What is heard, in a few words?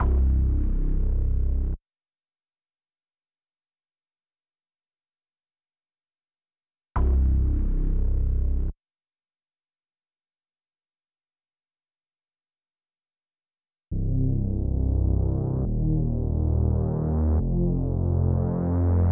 138 BPM C Min Bass; Trap; Melody Loops; Loop Stems; Cymatics; Nightmares; Devil